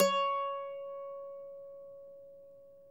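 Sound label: mandolin C#4; Sonic Reality; American Heartland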